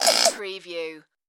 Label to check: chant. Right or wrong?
Wrong